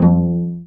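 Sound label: cello pizzicato C; AKAI S-Series CD-ROM Sound Library VOL-2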